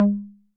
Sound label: Conga Low 808 Sat; Saturated